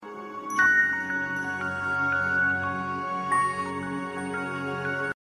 Firefly melody

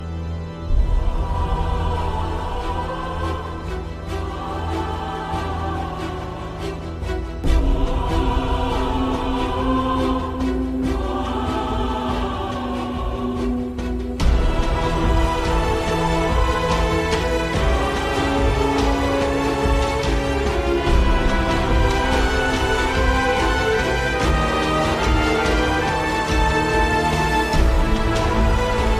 Heart touching; Dhoom

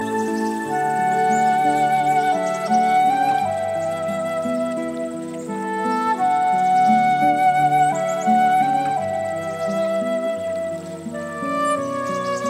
Tranquil Spa Music

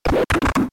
rpg laugh; Bowser

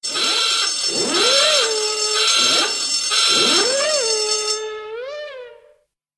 Klaxon

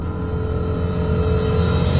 Speed Motor

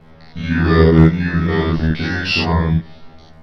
Notification Cyborg

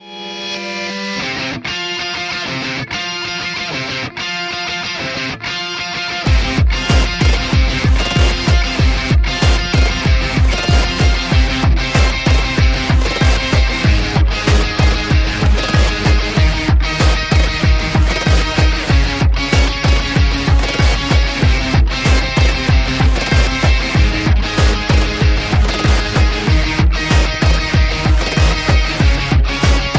ringtone; re zero

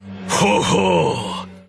HO-HO ANIME SOUND

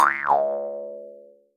Cartoon Spring Boing

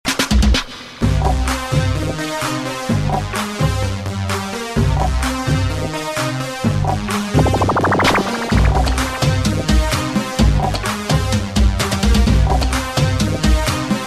Funk Brazil Remix

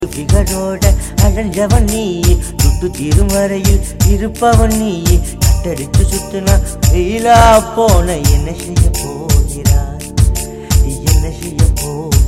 Comedy Love

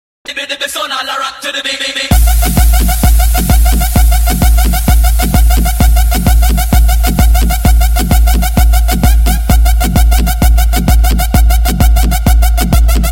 Electro Spin

